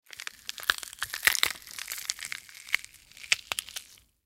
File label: Horror Rigor Mortis Flesh Crunch Rip Tear Slow; Scary Soundscapes; Blastwave